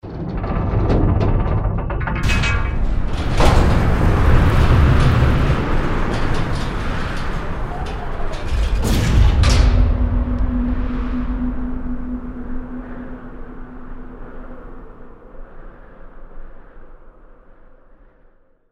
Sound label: Ruins Gate Open With Distant Shot; Journey